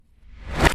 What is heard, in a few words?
Riser